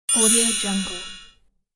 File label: fairy quick magic wand